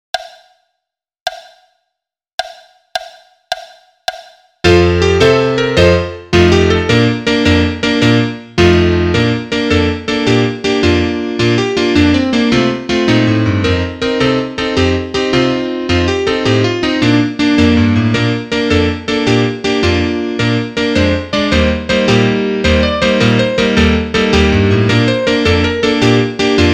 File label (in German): Klavier Solo, trompete; Tarantella